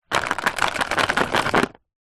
household clothes pegs wooden in plastic tub shake